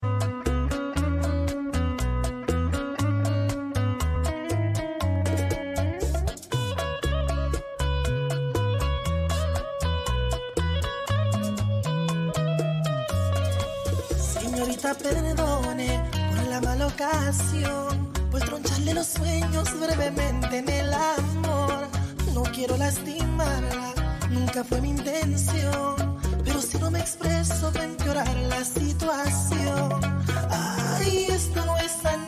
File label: LATIN MIXING LIVE